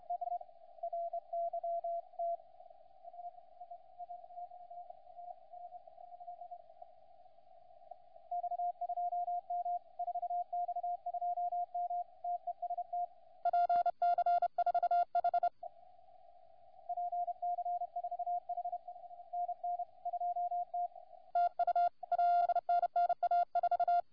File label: CW 14MHz; CQWWCW